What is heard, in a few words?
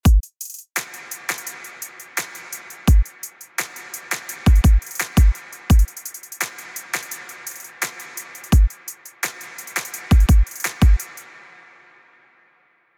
drums